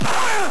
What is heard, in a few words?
ik punch; ikplus